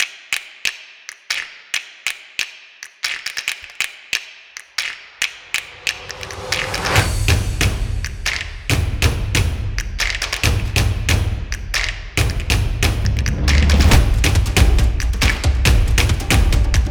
Epic Stomp Percussion

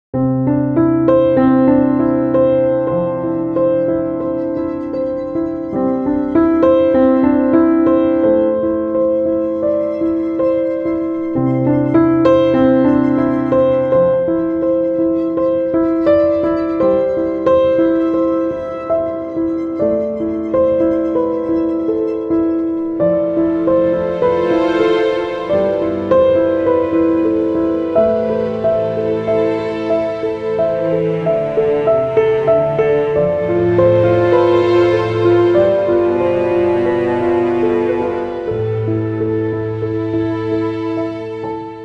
Emotional Romantic Piano

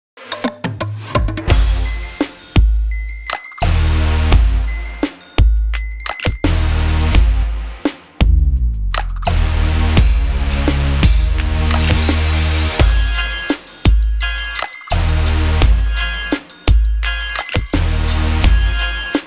Street Groove Hip Hop

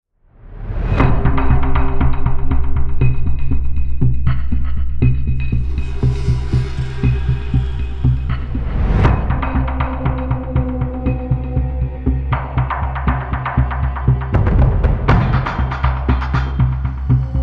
Detective Thriller Tension

